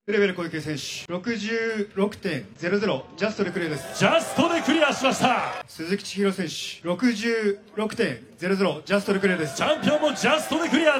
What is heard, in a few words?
vocals